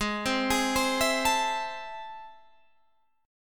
A Minor Major 7th, G sharp

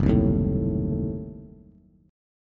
Gb Diminished 7th Bass; strummed; JGuitar

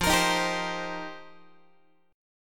F 9th Suspended 4th F Guitar, chord; strummed; JGuitar

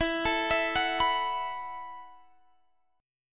E Suspended 2nd Suspended 4th, Guitar Open D; x,19,19,22,21,21; down

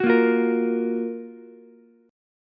Gb Major 7th Gb Ukulele; GbM7 strummed; JGuitar

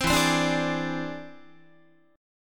E Augmented 7th E down Guitar; E+7 strummed; JGuitar

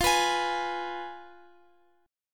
F Diminished, Guitar, Open F; strummed

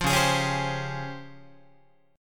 C sharp Major Sharp 11th, Guitar; C#M#11 strummed; 8,9,11,11,11,11; JGuitar

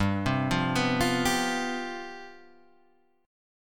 C Major 7th Suspended 4th, G, guitar chord

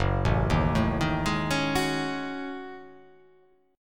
Gb Minor 6th Add 9th Gb down; arpeggiated; JGuitar

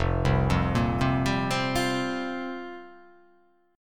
F Suspended 2nd Suspended 4th F down

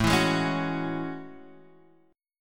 A Major 13th, Guitar, Drop D; chord

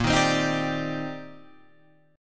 C Minor 9th Bb, Guitar Standard; Cm9/Bb strummed; JGuitar

